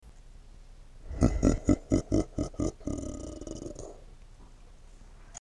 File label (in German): lachender